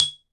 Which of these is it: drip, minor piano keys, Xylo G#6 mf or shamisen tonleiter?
Xylo G#6 mf